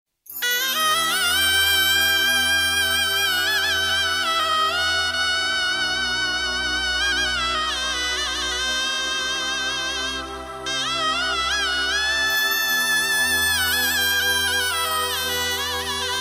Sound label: Instrumental